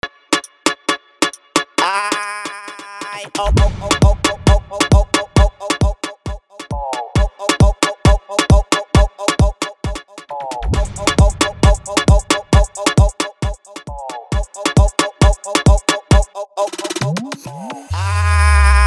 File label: intro acapella breakdown 134bpm; remixer from Nicaragua